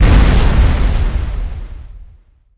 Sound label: Explo Small; Destruction